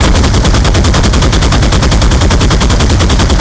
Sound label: MK108; Weapons; DCS